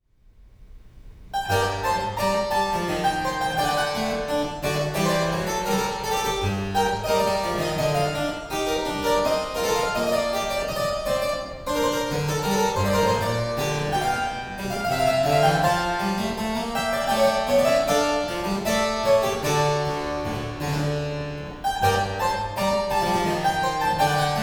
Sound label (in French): pieces de clavecin courante